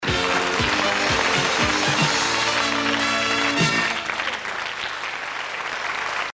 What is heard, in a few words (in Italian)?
stacchetto vincitore; Stacchetto del vincitore della puntata - 1986/92